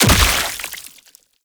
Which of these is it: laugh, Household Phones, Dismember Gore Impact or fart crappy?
Dismember Gore Impact